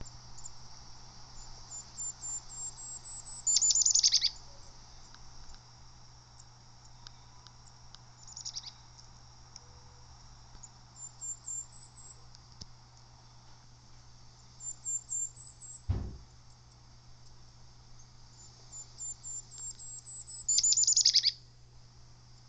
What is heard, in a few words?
kinglet song